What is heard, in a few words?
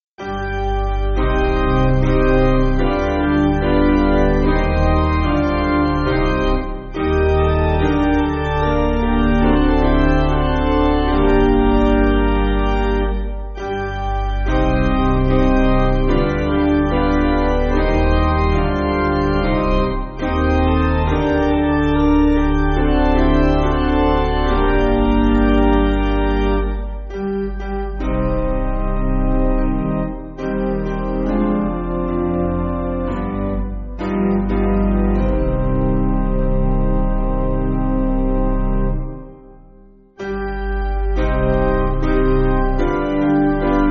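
Spiritual, Organ Piano; 5/Cm; They Crucified My Lord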